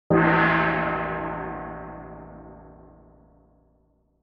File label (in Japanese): 中国4000年のドラ